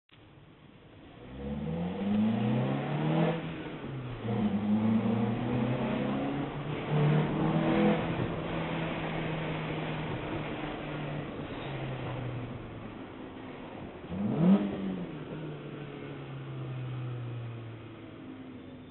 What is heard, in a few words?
drive; 206xs; air2